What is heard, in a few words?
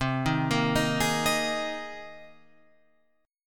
C Minor 7th Sharp 5th C Guitar Open F; Cm7#5 arpeggiated; JGuitar